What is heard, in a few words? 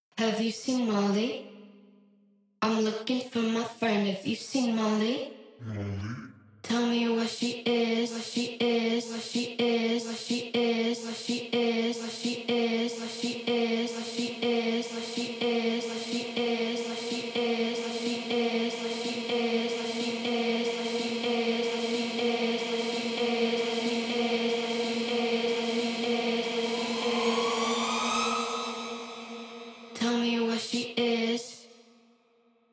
Vocal Acapella; 124.0 BPM